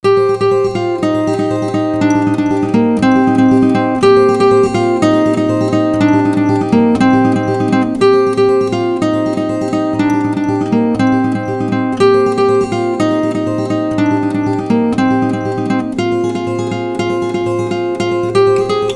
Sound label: Instrumental